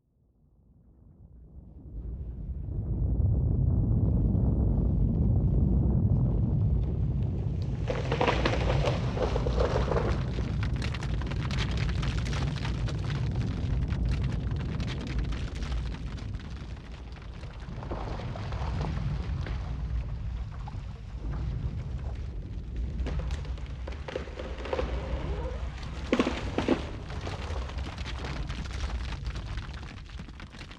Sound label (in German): Erdbeben; Natur